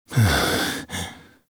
We All Fall Down Alt Breaths; Arthur; Warframe; NineteenNinetyNine; Stage12PartyOfALifetime

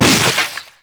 Infested Runner Arm Attack Hit D; Enemies; Abilities; Warframe; Proto Infested

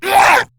Attack, Battle Barks; en; Grineer Ghoul Sawman

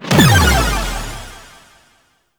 Hi Score Revive; Warframe; Nineteeen Ninety Nine; Deep Archimedia

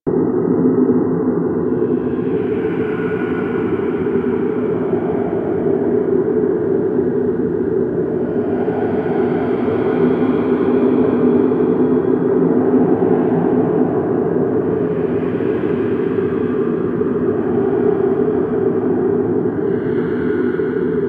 Ice Interior Ice Cave Amb; Warframe; Corpus; Ice Planet

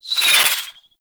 Bow Arrow Rain Whizz By C; Ultimate Abilities; Madurai; Warframe; Focus

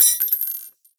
Belt Fed Rifle Links I; Warframe; Tenno; Frumentarius

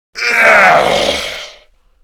Death Grineer Male; Warframe; Battle Barks

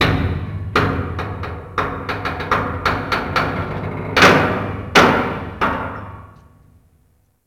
Derelict Metal Creak Slow, Interior; Ambience; Warframe; Orokin Derelict

